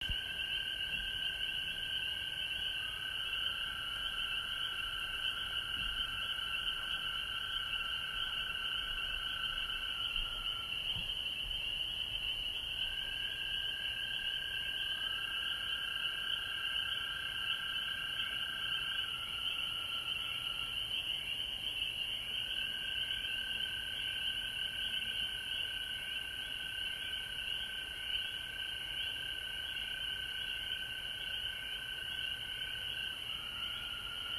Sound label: frogs home Georgia